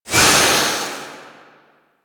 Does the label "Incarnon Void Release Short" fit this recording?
Yes